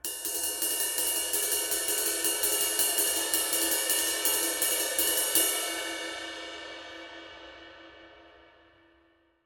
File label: 23in A Sweet Ride, cymbal sound